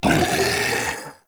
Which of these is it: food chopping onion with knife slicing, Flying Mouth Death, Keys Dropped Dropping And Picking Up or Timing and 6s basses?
Flying Mouth Death